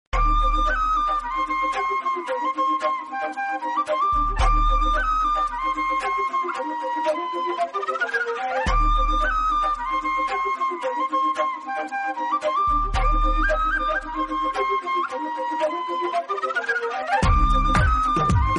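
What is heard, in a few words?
background music Telugu Ringtone; MCA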